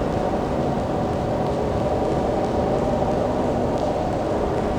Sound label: Cracklature, sparse soundscape